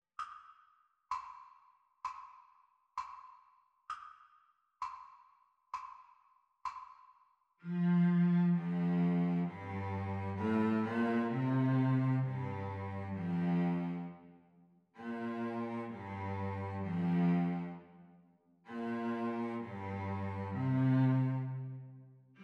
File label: Cello Duet Playalong Sea Song Practice Speed; The Teacher part